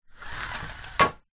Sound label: household blind venetian drop lower; zapsplat